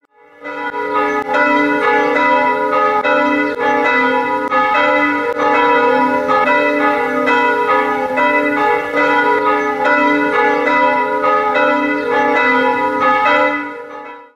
soft bell; sony; ringtones; sms

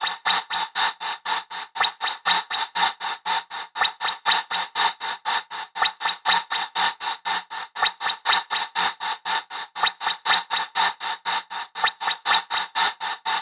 Echo Signals

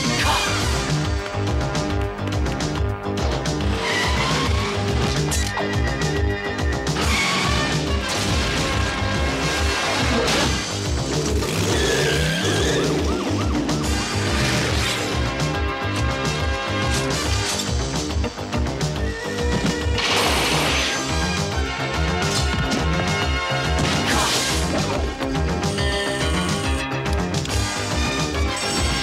80s Cartoon

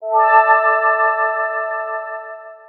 soft sms; ringtones

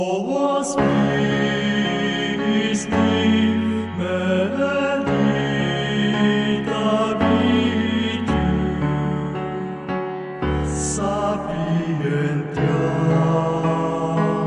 Chorus

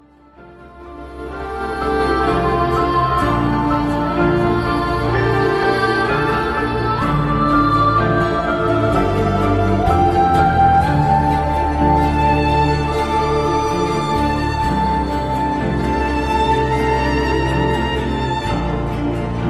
violin

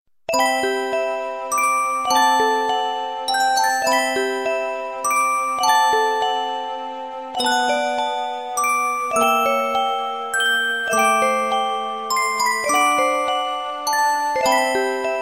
3d tone, ringtones electronic

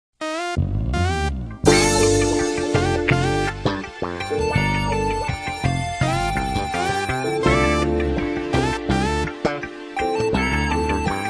dope g funk instrumental beat

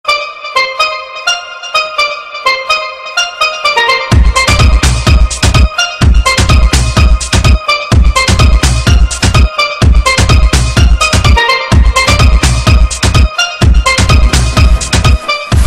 drump; alternative

